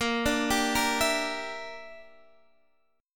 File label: A sharp 6th Flat 5th, Guitar DADGAD